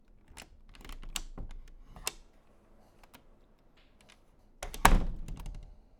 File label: Door Knob